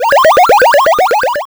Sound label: bfxr Extend; Cirno2022; Legacy